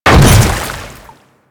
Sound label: Hammer Boss Land Water, Footsteps; Warframe; Grineer